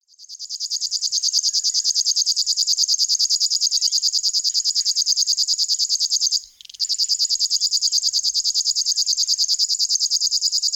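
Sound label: river warbler voice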